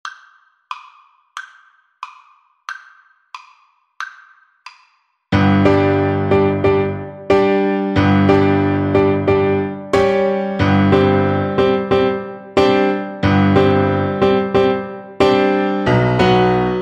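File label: romanian folkdance VLC karaoke, Cello; bartok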